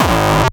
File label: Bassdrum